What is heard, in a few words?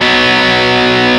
x x 4 4 2 Bm; osu